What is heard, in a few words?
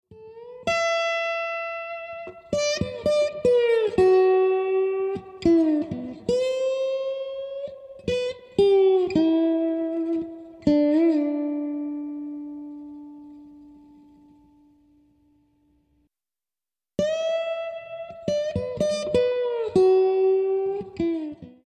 swamp guitar